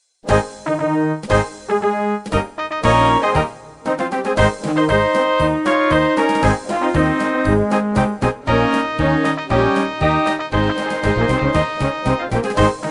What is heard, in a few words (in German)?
Marsch